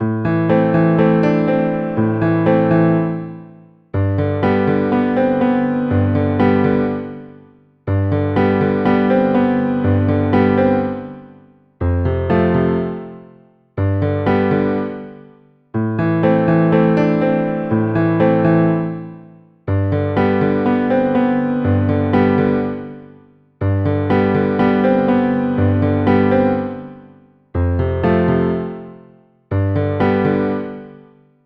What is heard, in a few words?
Piano Chords Amin